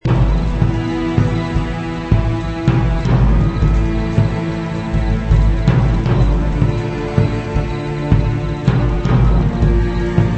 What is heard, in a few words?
music; ambiera; coppercube3d; 3d game demo - darkness springs - mod